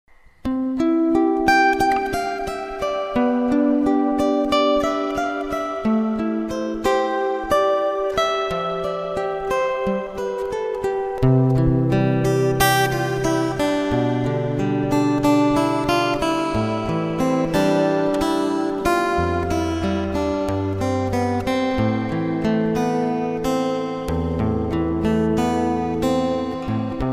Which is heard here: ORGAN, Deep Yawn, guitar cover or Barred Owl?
guitar cover